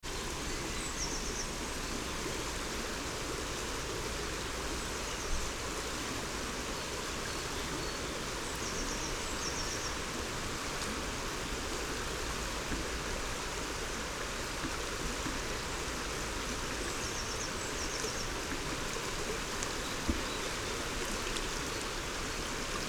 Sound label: river with grey wagtail and dipper